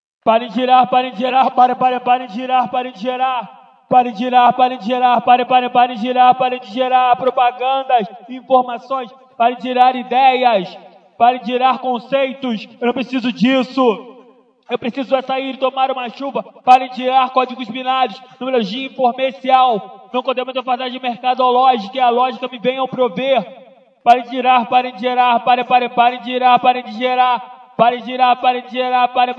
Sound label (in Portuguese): capella